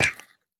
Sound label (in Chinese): 海龟幼年受伤声